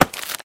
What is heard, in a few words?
grab